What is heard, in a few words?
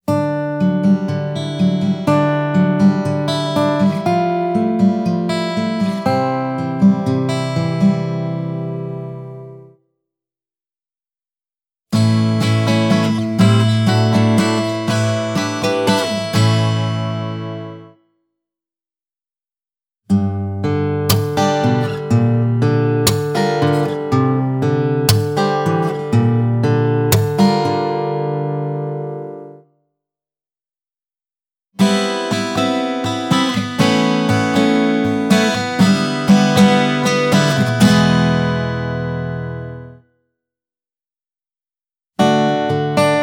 Acoustic Strum, Tap, Slap